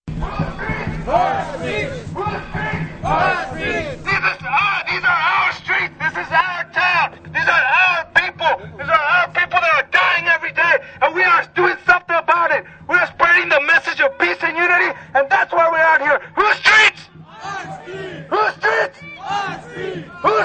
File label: chants